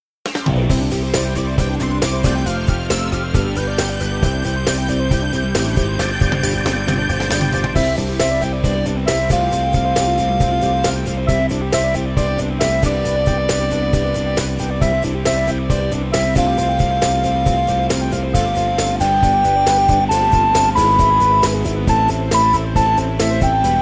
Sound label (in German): blockflötentaxi